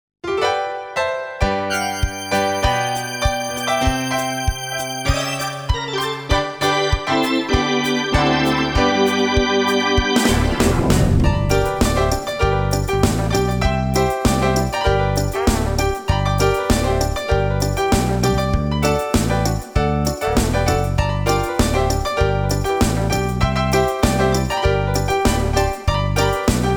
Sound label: gospel